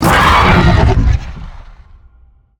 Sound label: Staircase Tell; Evolve; Behemoth